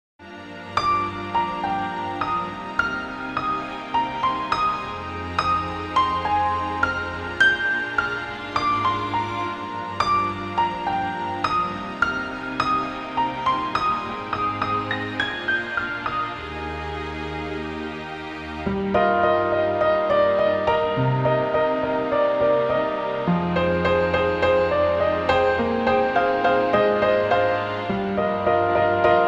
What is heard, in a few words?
piano instrumental; papikon futago no musume wa dou kyousei original soundtrac